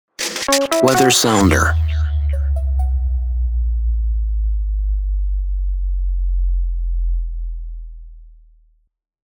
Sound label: Weather sounder